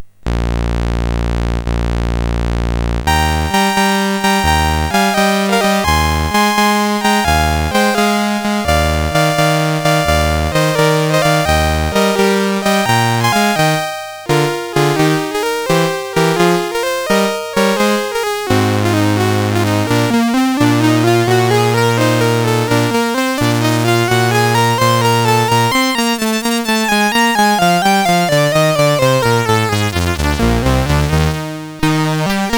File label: mus CSG8580R5; Pirates of Penzance; Opening Chorus of Pirates and Solo